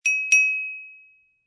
Wine Glass Hit Ding Two Quick Hits, Household, Wine Glass Hit With Silverware, Ding, Ring, Toast, Two Quick Hits; Dishware